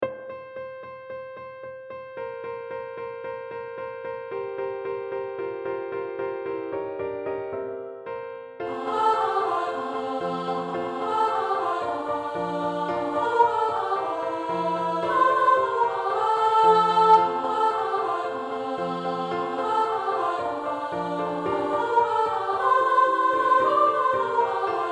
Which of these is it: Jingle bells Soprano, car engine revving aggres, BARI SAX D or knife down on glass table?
Jingle bells Soprano